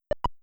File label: Equip; mother1